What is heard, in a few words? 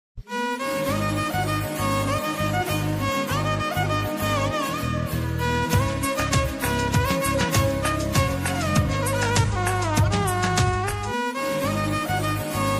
Love Ringtone Background Music; Nenu Super Khiladi 4